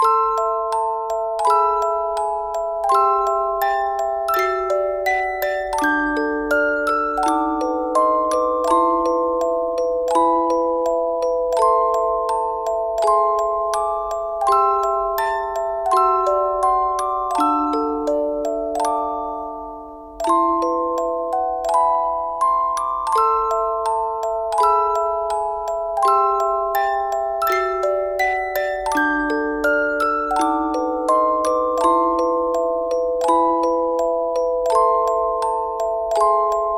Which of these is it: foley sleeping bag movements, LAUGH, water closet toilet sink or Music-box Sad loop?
Music-box Sad loop